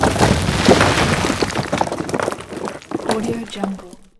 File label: rupture rock impact; soundmorph